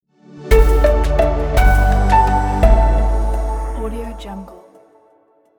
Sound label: positive light piano logo